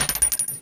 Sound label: Bullet Shell Drop